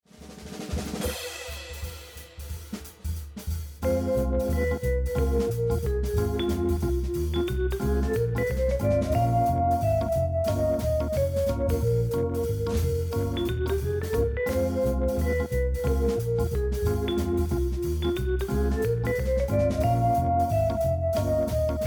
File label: theme jazz, music; pbskids; go studio